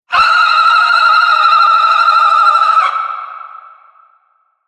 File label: Scream Banshee, monster; Monster Sound Effects; horror sound effects